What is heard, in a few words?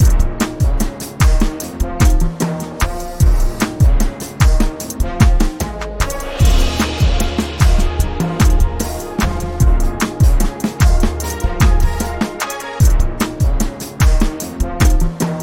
Jazzy Jazzy